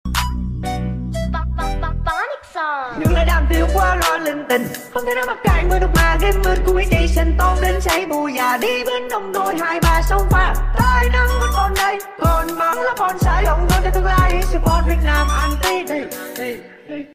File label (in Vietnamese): Nhac chuong ma gaming lai trap tiktok